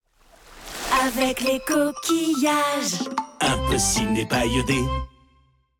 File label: JINGLE; BELAZAR; CNC; COQUILLAGE